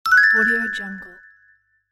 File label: completed level; game